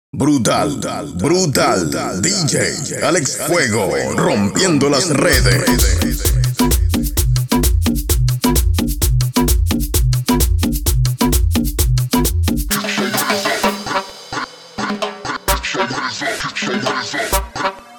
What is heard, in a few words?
130 BPM, bootleg mix; guaracha